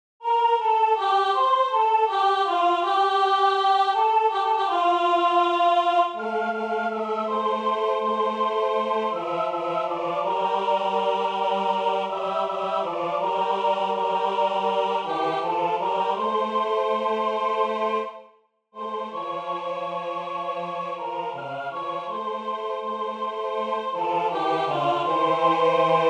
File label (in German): Tutti; oSoleMio; Italien